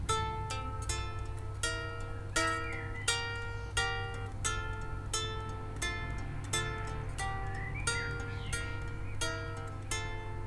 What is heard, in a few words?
etude mic, ukulele